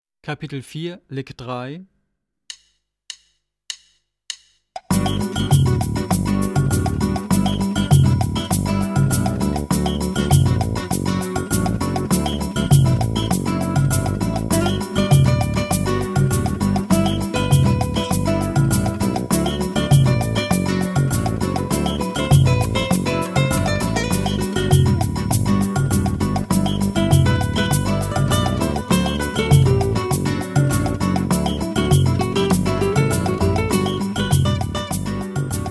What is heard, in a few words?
Latin Style Guitar